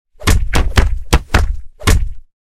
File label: Kick Block Punch Combo; Hollywood Edge Martial Arts and Human Impacts